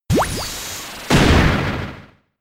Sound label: Mud Bomb; Pokemon; Gen 5; Blk Wht Blk2 Wht2